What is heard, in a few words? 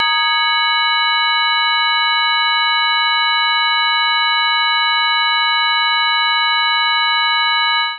Fourths Cluster, Drone; C-1-B6 128 bars; MIDI Clips; Ableton; Drone Lab; Notes and Clusters